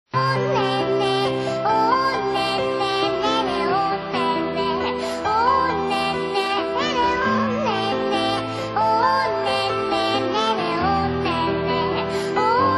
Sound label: Baby Cute